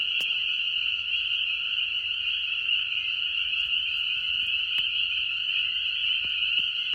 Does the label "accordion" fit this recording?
No